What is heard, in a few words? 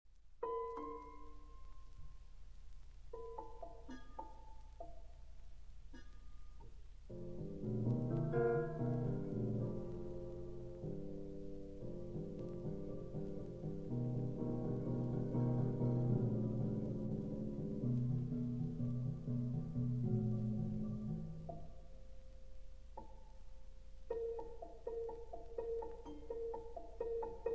Amores For Prepared Piano and Percussion Solo For Prepared Piano